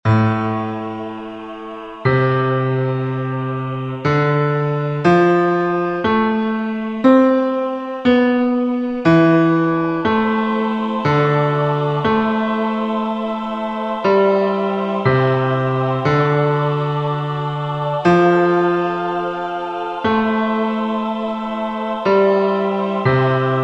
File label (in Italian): piano Basso